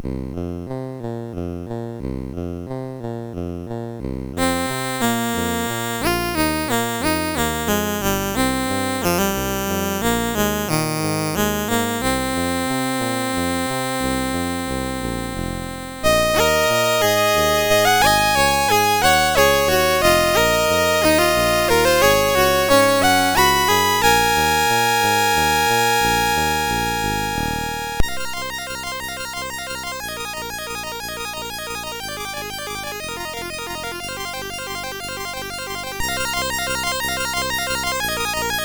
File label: Fourth Chord Experiment, MOS6581R2